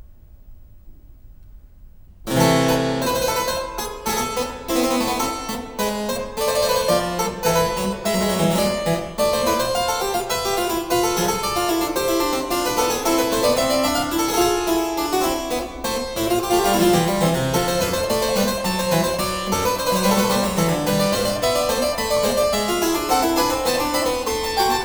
Harpsichord

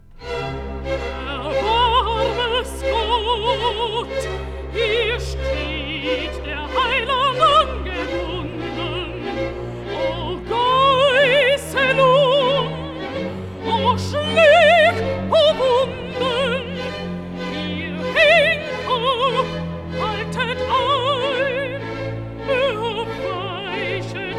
Recitative (Alto); Erbarm es Gott; Bach Matthäus-Passion BWV 244; Karl Richter (conductor)

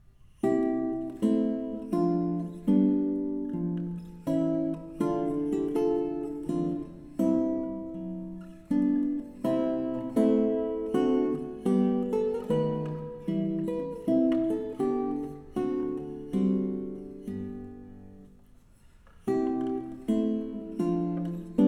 Menuet in C major; Fernando Sor Early Works

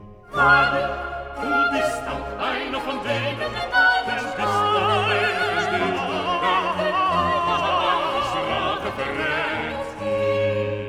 Chorus; Wahrlich du bist auch einer von denen; Bach Matthew Passion

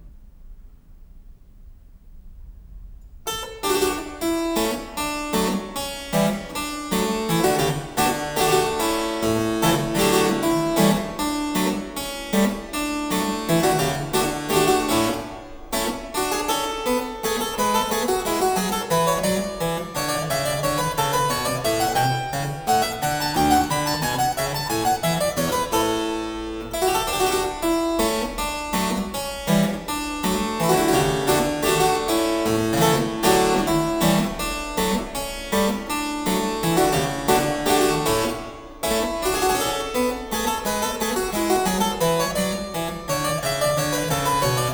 Harpsichord; Blandine Verlet; Bach; Complete Partitas for Harpsichord; 巴赫羽管键琴组曲全集

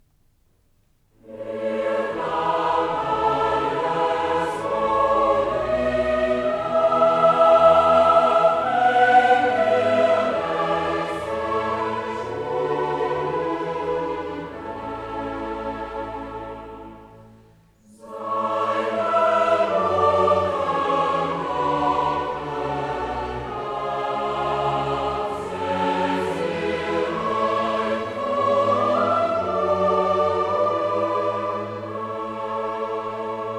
Chorale; Er nahm alles wohl in acht; Bach Johannes-Passion BWV 245; Karl Richter (conductor)

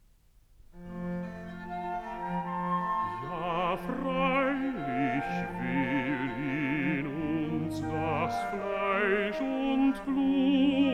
Recitative (Bass); Ja freilich will in uns das Fleisch und Blut; Bach Matthäus-Passion BWV 244; Karl Richter (conductor)

